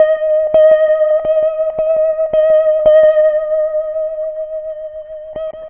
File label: Lap Steel, Trip-Hop Loops